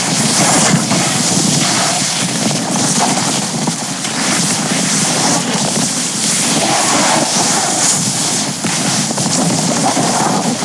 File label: Sfx Wintergames